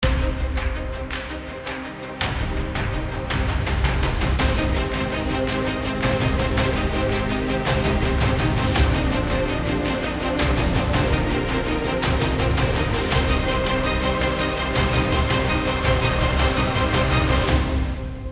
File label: Instrumental BGM; Soorarai Pottru